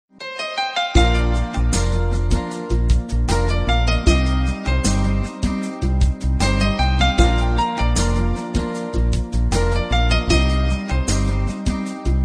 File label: melody guitar; classical